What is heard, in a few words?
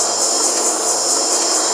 speedloop, force